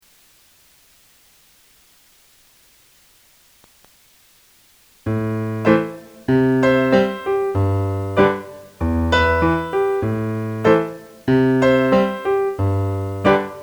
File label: piano with C and Em chords Medium; Muilean Dubh